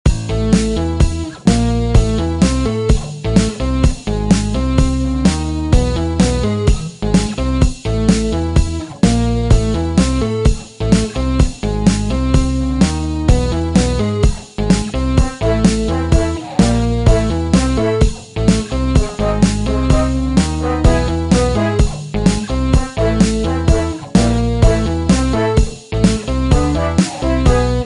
Boss Music

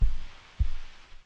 face crawl; Five Nights at Treasure Island 2020 Remake

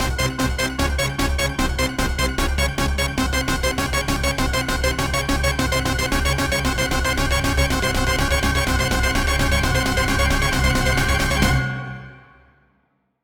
Drowning; Boll Deluxe

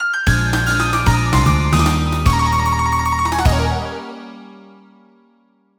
death; Donkey Kong Country 2 Fan Remastered; Hot Head Bop

